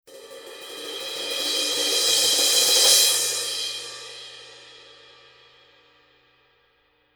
formula 602 17 crash roll; modern essentials

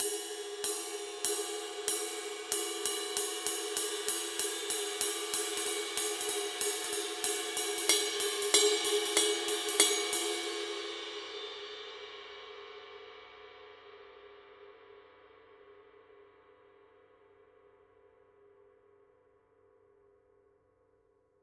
formula 602 20 ride pattern; modern essentials

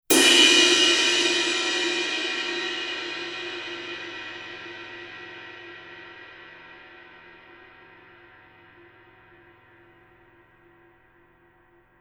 formula 602 24 ride edge; modern essentials

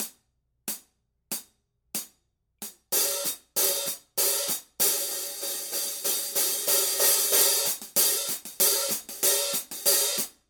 formula 602 15 hi-hat pattern; modern essentials

